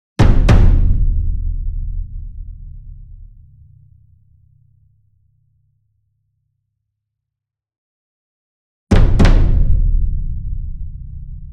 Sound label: ENSEMBLE DRUM Bass Drum Concert Double Near; boom library; Cinematic Strikes Construction Kit